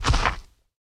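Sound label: short dull explosion sound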